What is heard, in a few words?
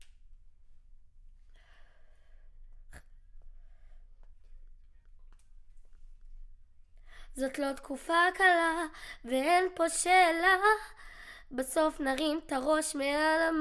Children Choir; Studio Bekol Children Choir